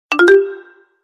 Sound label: msg tone; Advance